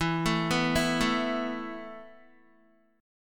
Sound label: E Suspended 4th E, down, Guitar; arpeggiated; 4F,4A,5C,5G,5C,6E; JGuitar